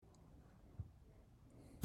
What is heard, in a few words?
Noise